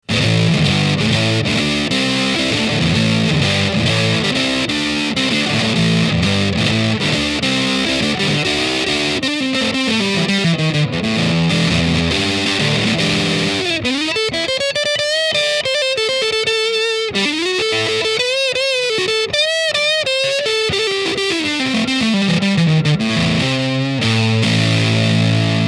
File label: Hard Drive Full boost TS